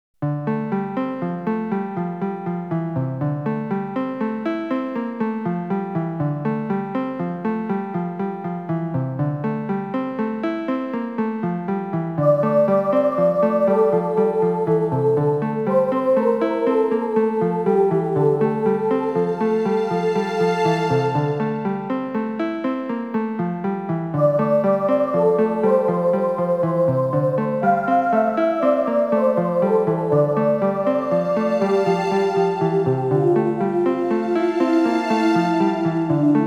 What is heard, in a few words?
Dungeon Cave; Skies of Arcadia Eternal soundtrack